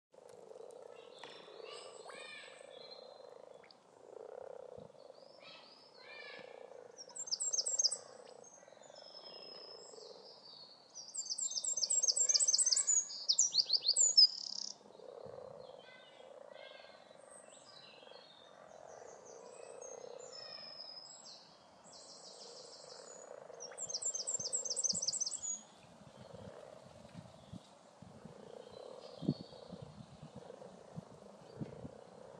frogs near Bracebridge Pool

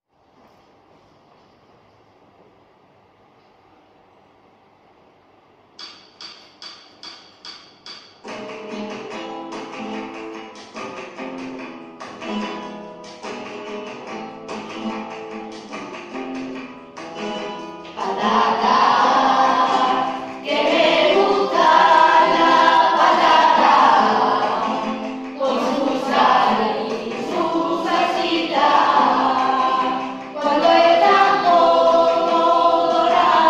melody

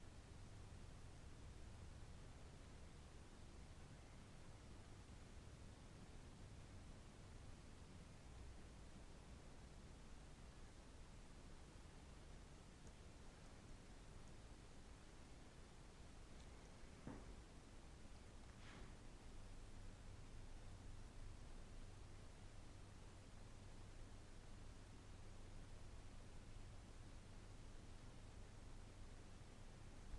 multi faith prayer room heathrow terminal 4

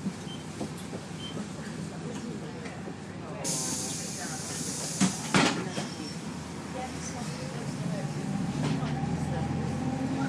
noisy bus

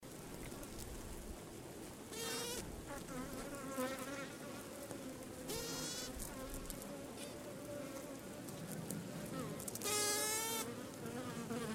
cyprian honeybees confronting the oriental hornet

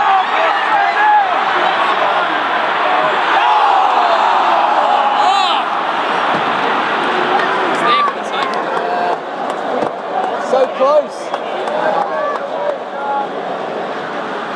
the roar with a missed shot

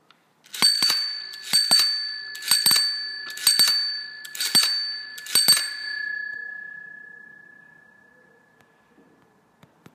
bike bell noise; celebrating the start of 30daysofbiking

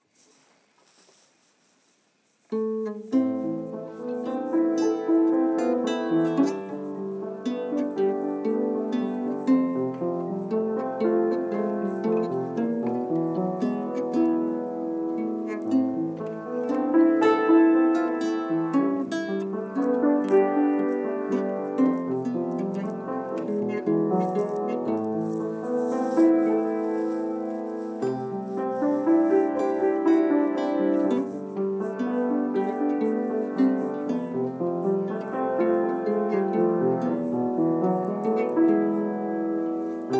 greensleeves bad guitar playing

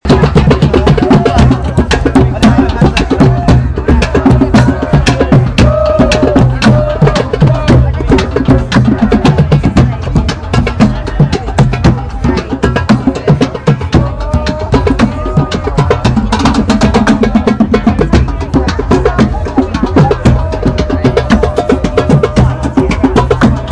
more drumming; ugc bigchill

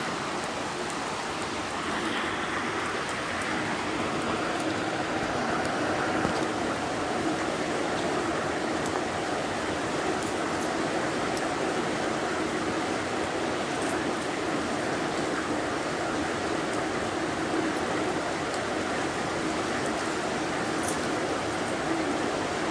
morning thunderstorm

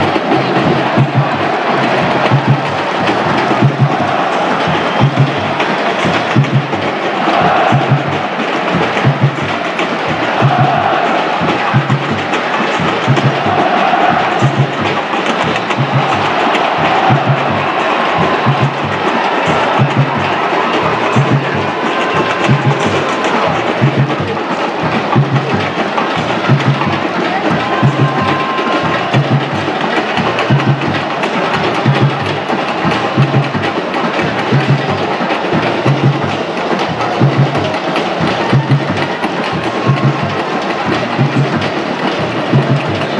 crowd noise with drums; Brazil Scotland

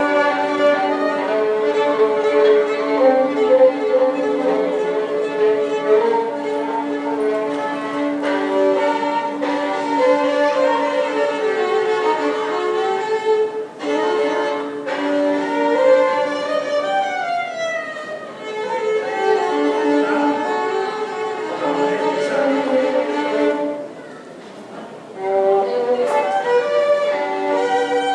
violinist busking in park guell barcelona

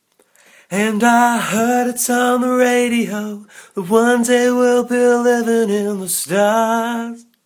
singing croaky

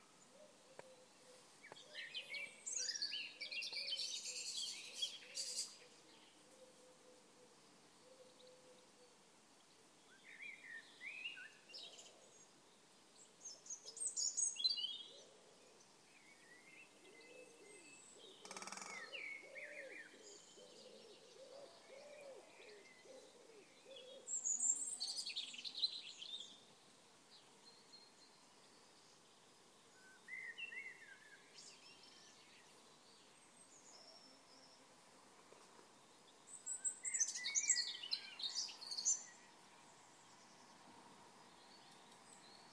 woodpecker drumming

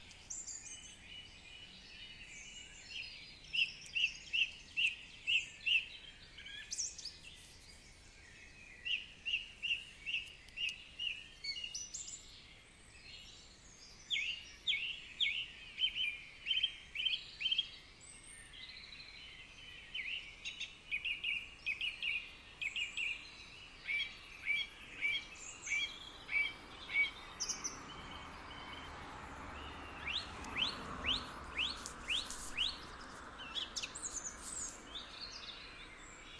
noise birds

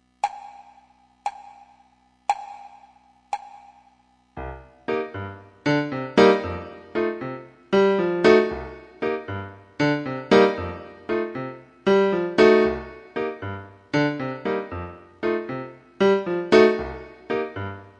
baiao piano

